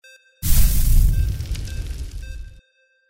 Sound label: SCIFI FIRE FLAME; windy beach audio